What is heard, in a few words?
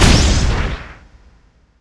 Weapon Spear Hit